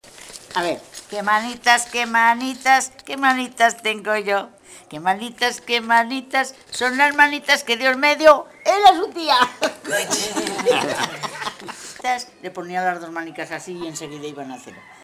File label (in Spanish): Cancioncilla Infantil